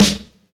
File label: Acoustic Snare C# Key, Royality free steel snare drum tuned to the C# note. Loudest frequency: 2177Hz; snare drum samples